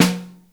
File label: Overhead, Snare; Ableton Foundation FreePacks; Snare Designer